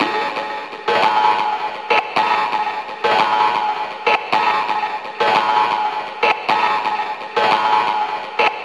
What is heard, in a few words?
bowl loop